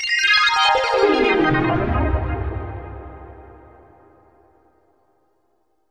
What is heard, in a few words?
AMBNT 160; Ambient